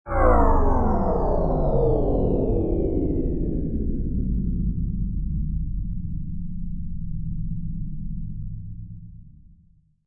Ultra Low Dive, Deep diving sound.; Rises and Dives; Sound Design

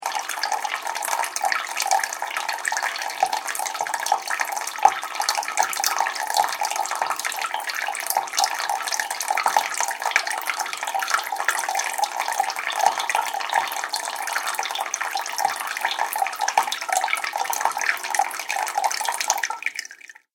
Water Fast Dripping

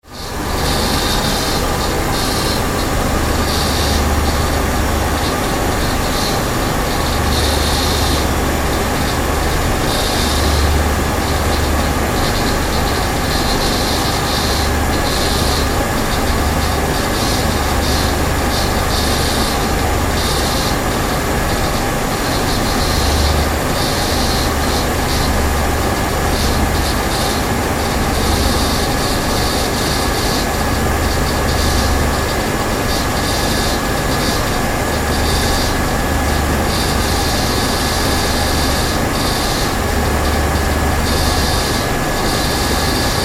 Ship Large Car Ferry Engine Room; Tom Hutchings

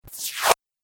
Droid Suction Cups, robot

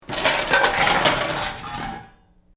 Metal Debris Impact Short Medium; Small metal junk crash

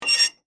Squeak Metal; Texture; Squeaks; Sound Effects:Objects

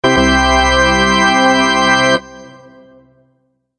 Ta-Da Organ; Musical

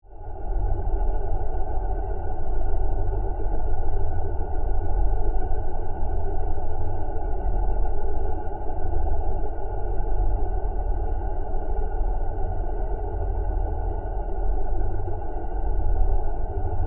Drone Alien Power, Source, Scifi; Science, Fiction; BlastWave

